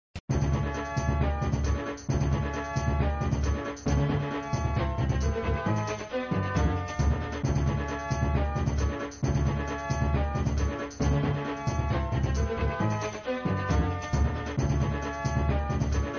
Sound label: Music Three challenges (looping), flipper